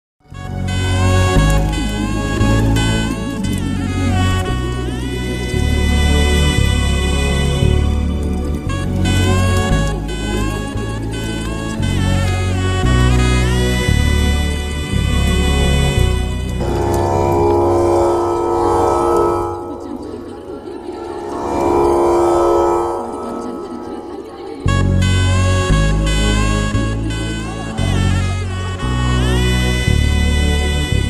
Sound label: amma bgm emotional bgm; akhanda